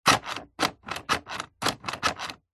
leisure rc car upside down front wheels turn action click